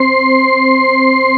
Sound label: WAVE ORGAN C5; EdgeSounds; Drum Mashines VOL-1